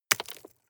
drop stones small

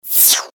human ziumm

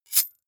knife slash light

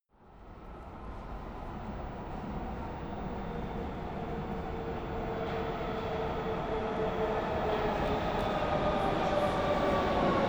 train arrival big train station

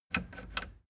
plastic button latch click disable slow

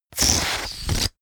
balloon air release fart airy

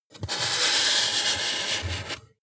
marker mark normal

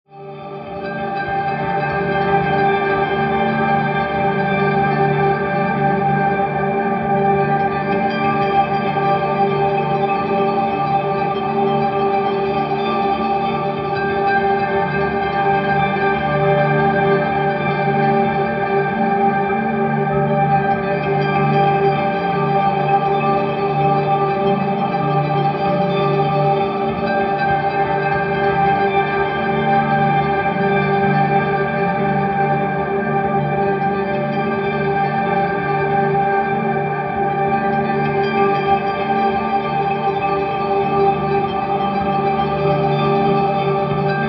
mysterious heaven pad

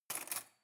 cutlery cake fork drawer grab hard

